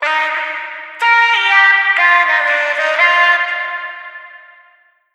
Vocal Sample Pack Phrases Eb; Slate Digital Omnivox Vocal Sample Pack

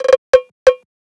perc cowbell, Drum One Shots; SD MIDNIGHT Sample Pack; Slate

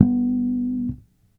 Bass one shot Harmonic Wiggle G, Electric Bass; Slate Digital BASSHOLE Sample Pack

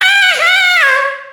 Vocal Sample Pack Phrases C#m; Slate Digital Omnivox Vocal Sample Pack

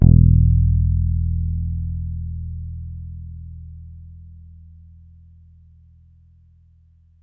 Bass one shot Low E, Electric Bass; Slate Digital BASSHOLE Sample Pack

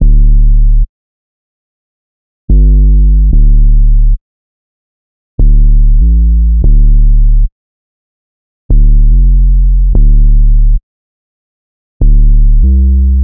808 loop 145 Dm; Slate Digital BASSHOLE Sample Pack

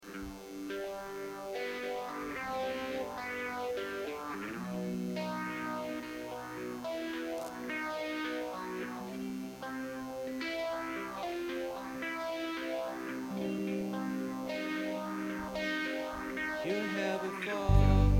Buzz and Feedback Mix

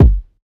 kick satty; Drum Sample Pack; One Shots; Slate; SEISMIC

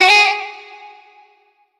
Vocal one shot Ab; Slate Digital Omnivox Vocal Sample Pack

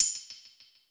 hats, Pop; Drums; Slate; SD PASADENA Pop Sample Pack

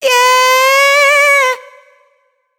adlib Hey Cry wet, Raw Vocals; Slate Digital Omnivox Vocal Sample Pack; Adlibs

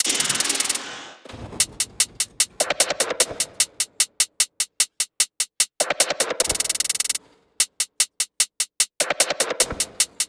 tops 150, Drum Sample Pack, Loops, Top Loops; SD SEISMIC; Slate

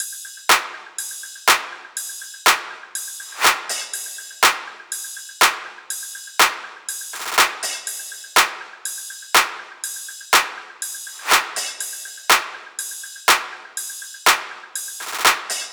top 122, Drum Loops; SD MIDNIGHT Sample Pack; Slate

